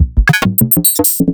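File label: Roland MV8800 drum FM KIT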